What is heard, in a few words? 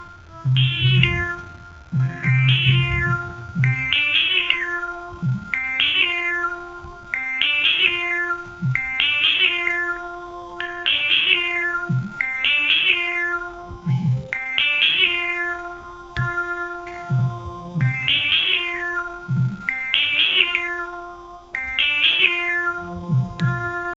fun rhythmic arcade music, cheerful